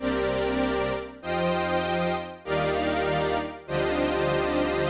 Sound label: Roland Cloud Juno-106